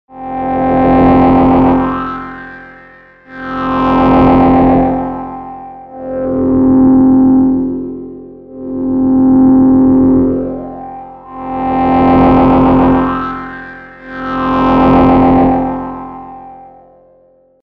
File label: Mini Brute V Void Oszillators